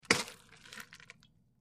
Mud Plops Movement

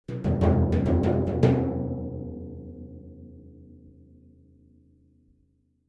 Timpani, (Hands), Accent, Symphonic Finale Hits

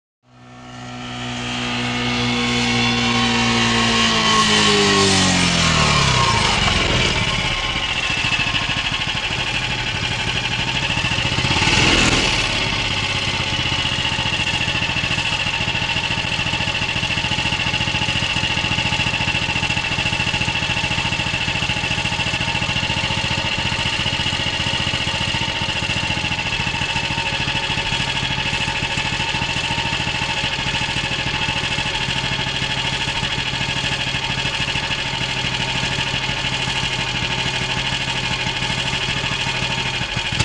Stop Off Bubble Car, bubble car

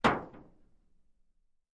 Metal Impacts Light, pipes

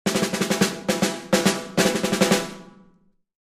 Drums Snare March

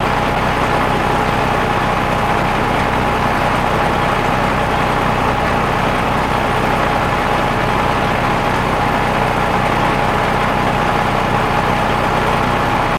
Cement Mixer Loop, cement truck

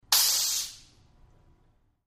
Air Release Valve

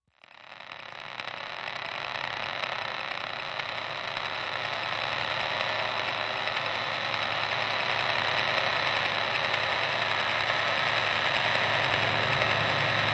Transmission End, static

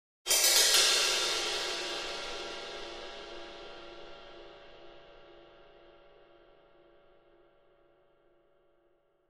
Cymbal Accent, drums cymbal

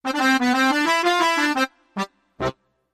Music Logo Accordia, accordian

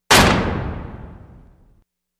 Industrial Oven Door

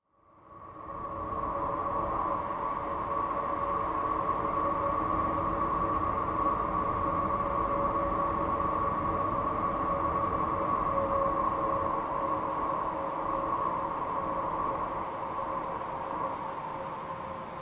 Reverberant Clangs, bys

